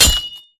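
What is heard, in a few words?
Impact Pulse Blade Flesh; Titanfall 2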